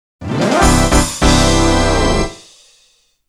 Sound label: Interlude start grid; Mario Kart 64